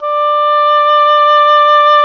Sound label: WND OBOE2, Oboe vib